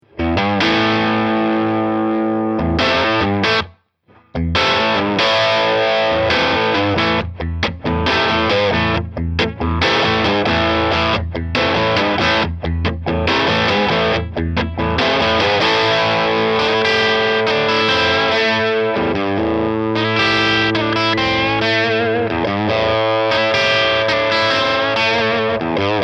Brownie ES 335

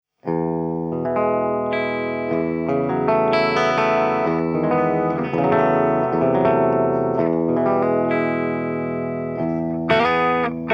ES335 volume Torpedo; Bluetone

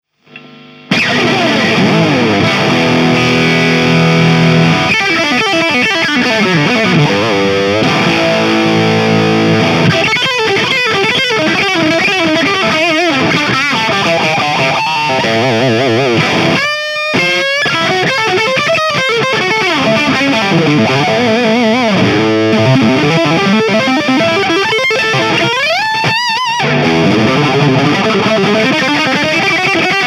Crunch Torpedo; Bluetone

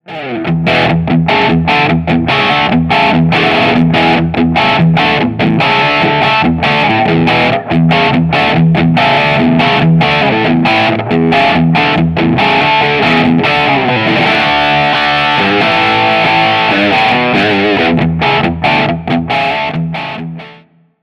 Clean strat B pickup Closed back 4x12 cab; Vol 13; Treble 16; Middle 11; Bass 10

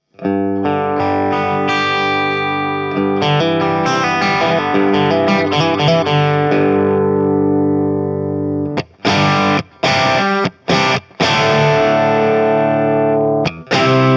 Tele Torpedo; Bluetone

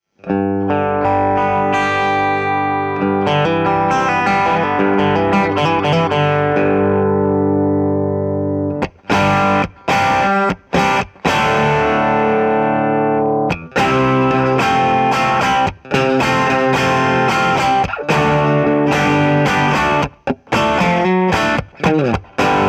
Tele Mic; Bluetone

